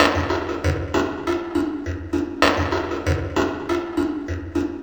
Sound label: Percusive; Akai; Mule